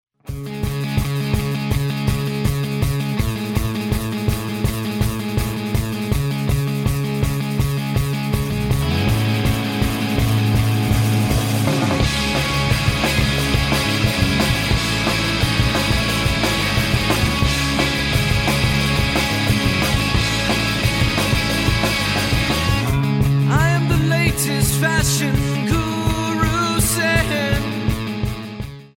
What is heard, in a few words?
mobile ringtone